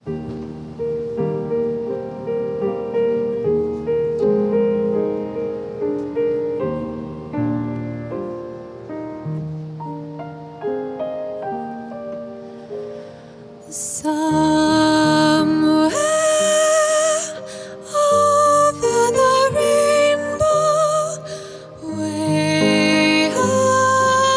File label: Vocal Solo; Sunday Services; A Service of Celebration And Remembrance